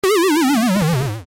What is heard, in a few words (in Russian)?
game over personaj proigral